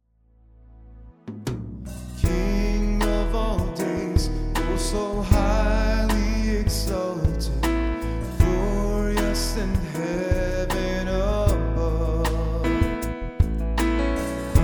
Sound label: vocals